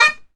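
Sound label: PIPE CHANTER, Bagpipes; E-MU Formula 4000 Series Vol. 4; Earth Tones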